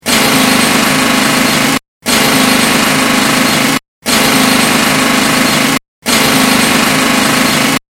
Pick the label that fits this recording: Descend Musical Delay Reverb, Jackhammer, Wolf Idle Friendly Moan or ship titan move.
Jackhammer